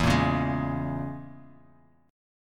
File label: E 6th Flat 5th Bass Standard, E6b5 chord; strummed; JGuitar